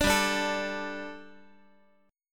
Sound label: G Suspended 2nd G, down, Guitar; Gsus2 strummed; JGuitar